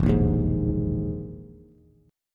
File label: E Major 7th Flat 5th E, Bass; strummed; JGuitar